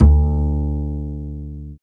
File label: D Bass